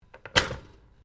Toaster Popup Pop; Various kitchen appliances; Household

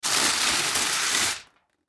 Tin Foil Roll Sheet, Tin Foil Roll Sheet Out Pull; Household; Rolling; Objects; Blastwave

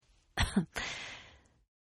Cough Female; Sickness Sounds; Human; Partners In Rhyme

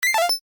UI Rollover Major, User interface feedback sounds

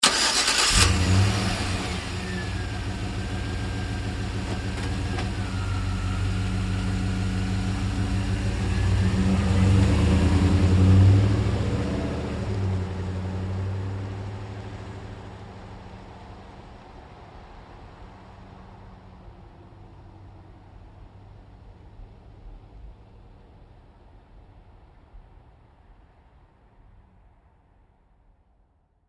Truck GMC Savana Start Away Slow; 2010 GMC Savana; Trucks; Vehicles

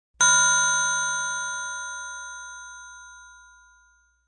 Ringer; Rings

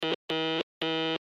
Morse Code W Letter; Electronics; Blastwave

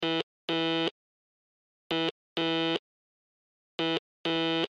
Morse Code, Morse Code Aaa End Sentence; Technology; Blastwave

